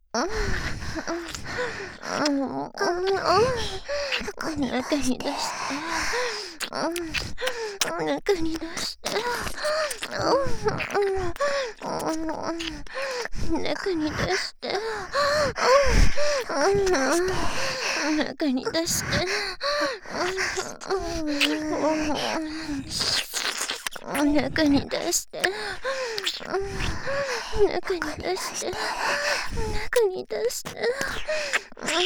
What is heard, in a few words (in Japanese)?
中に出して 耳舐め喘ぎ; ハーレムオナサポ 4人のメイドがご主人様のオナニーをお手伝いするバイノーラル～文、千曜、名月、莉桜～